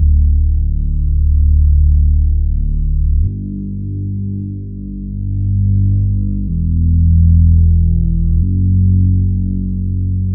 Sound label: bass count; Loops; Electronic